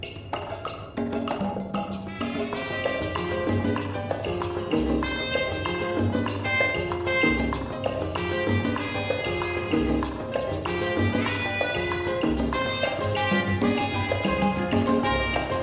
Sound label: Jukebox salsa